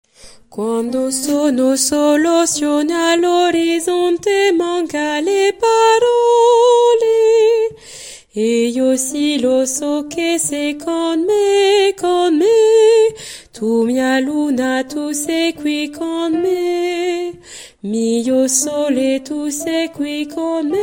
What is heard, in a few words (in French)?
Con te soprane couplet